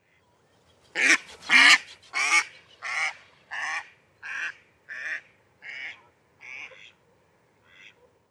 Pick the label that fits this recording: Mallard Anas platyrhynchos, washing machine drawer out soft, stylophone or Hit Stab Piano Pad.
Mallard Anas platyrhynchos